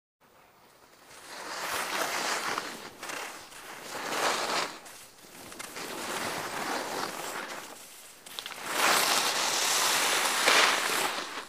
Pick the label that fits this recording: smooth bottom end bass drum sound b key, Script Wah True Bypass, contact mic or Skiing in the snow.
Skiing in the snow